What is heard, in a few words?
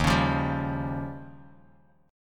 Eb 9th, Bass Standard; strummed; 11,16,15,12; JGuitar